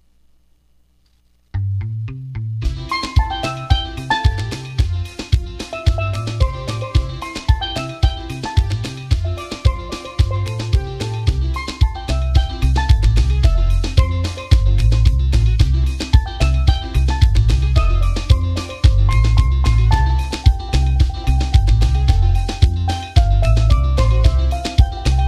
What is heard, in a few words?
calypso style, Steel Drum